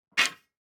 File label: DICE on Metal, Grab, Standard, Six Dice; Samples; ArticulatedSounds; DICE Sfx Party (Extended)